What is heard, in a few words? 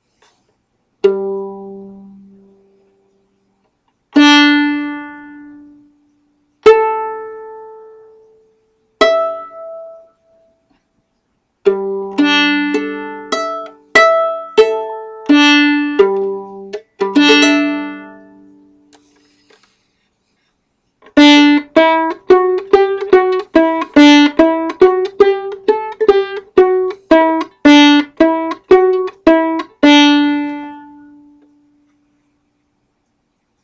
d string, fiddle